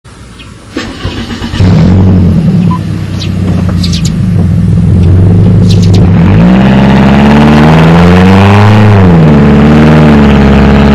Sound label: off out